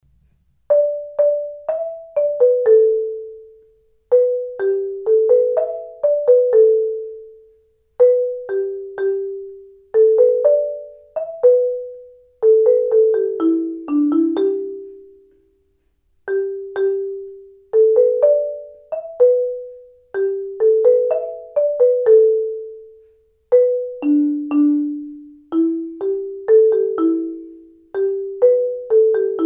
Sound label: mallets